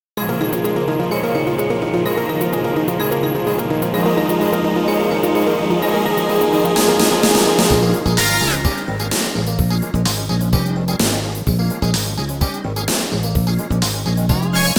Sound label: inst